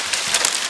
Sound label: tree rattle; tiny